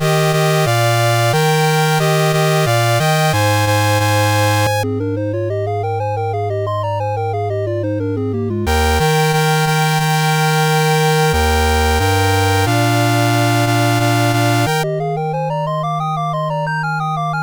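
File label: 1812 Overture, MOS CSG8580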